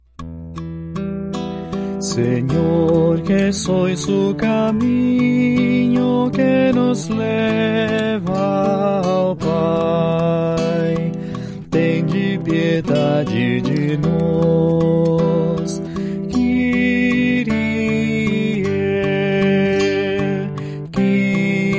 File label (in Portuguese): tenor